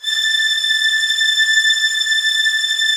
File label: TREM A6, Violin; Q Up Arts; Denny Jaeger Master Violin Library; PERFORMANCE